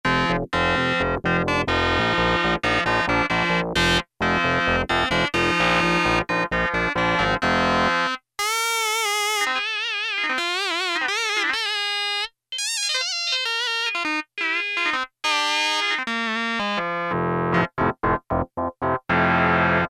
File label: GUITAR 2, Yamaha DX7